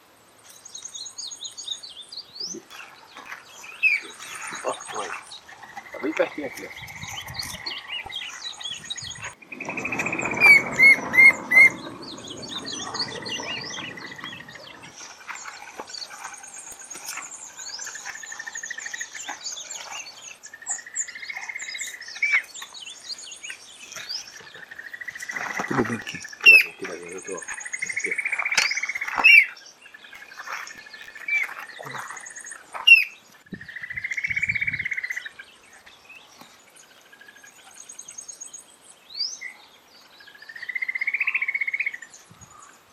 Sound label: great xenops